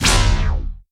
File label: Energy Whip